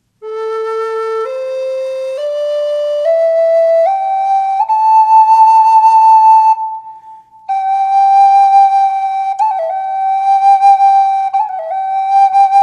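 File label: reverb, flute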